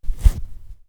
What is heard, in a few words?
snow single step, Footstep; RYK-Sounds